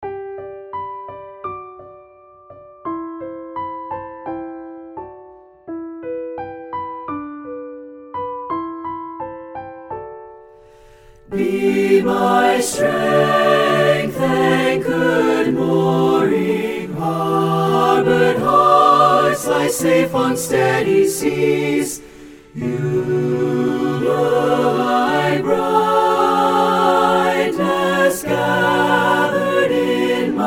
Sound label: Safe Harbor With Piano